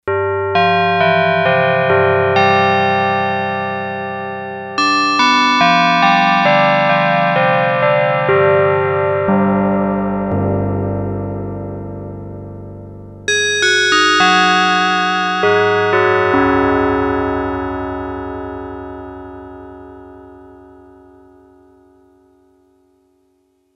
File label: Bell Wahh, Yamaha TX802; Factory Patches; Voices